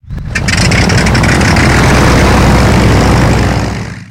morava start, engines; War Thunder

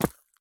sprint gravel; Escape from Tarkov

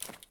multitool pickup; Escape from Tarkov

